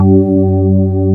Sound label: CX3 C3, hammonds; East West; Global Sounds